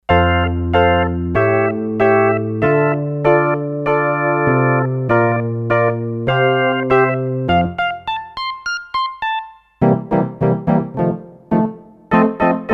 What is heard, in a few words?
Pforgan, Roland D-10; Factory Patches